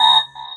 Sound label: combo break; [EZ] Ekoro blue